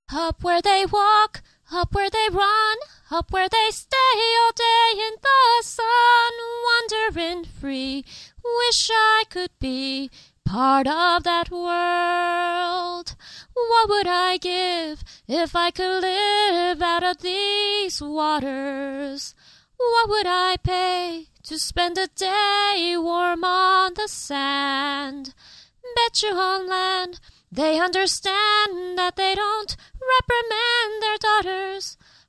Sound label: song